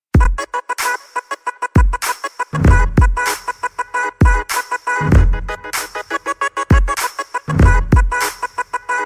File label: trap tone